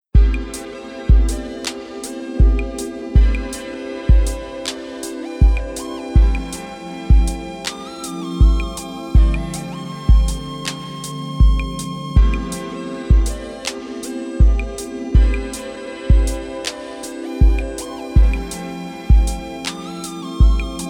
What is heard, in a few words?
vocal chill 432 hz